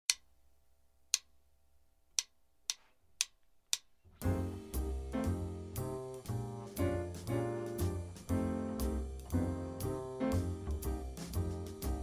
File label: Jazz Band